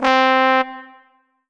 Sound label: Roland JV-2080 Trombone C4